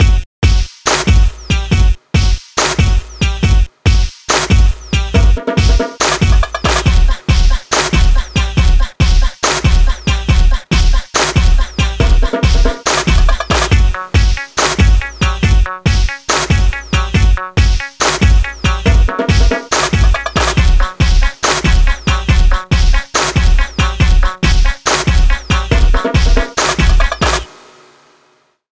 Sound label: futuristic